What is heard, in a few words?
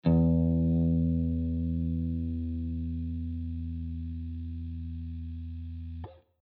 E 6th string, guitar